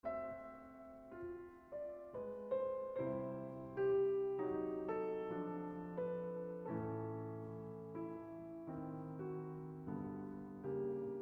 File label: Piano Sonata No. 2 in B-Flat Minor, II. Non Allegro - Lento - L'istesso Tempo